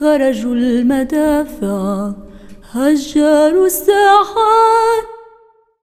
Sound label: SINGER, ArabianTraditions